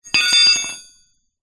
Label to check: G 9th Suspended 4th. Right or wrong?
Wrong